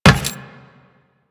Run Metal, Footsteps; Warframe; Teshin

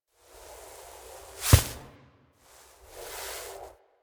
Puppy Foley Down Idle To Sleep Idle; Warframe; Kubrow

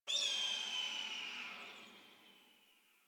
Exterior Distant Calls, Ambience

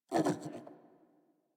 Puppy Idle Snort; Warframe; Kubrow

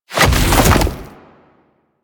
Infested Splitter Jump Launch; Warframe; Grey Strain

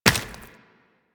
Run Flesh, Footsteps; Warframe; Tenno

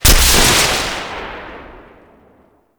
Void Storm Pillar Lightning Strike; Warframe; Railjack

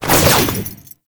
Ninja Teleport Jump Foley; Tau Dax

